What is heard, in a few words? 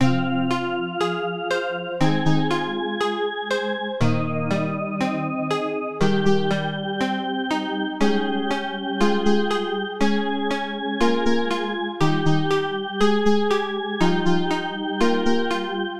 puzzle games music